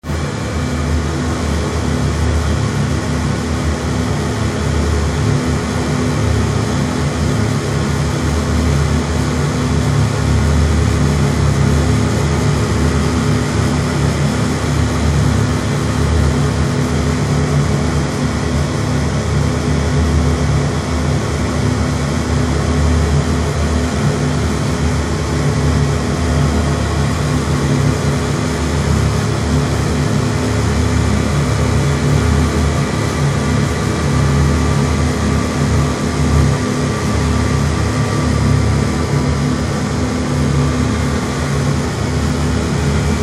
Ship Oil Pipeline Service Vessel Scrubber Room Atmosphere